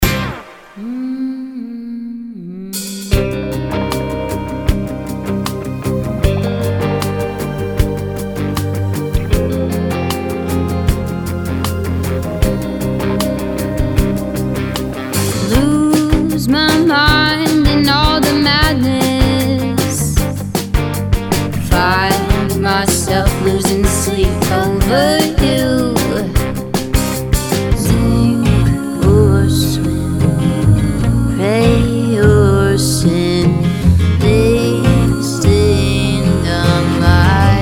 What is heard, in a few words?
Indie Medley